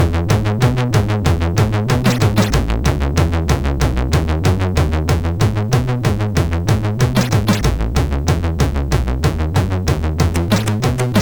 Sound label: Rock N Roll, sid MOS6581R4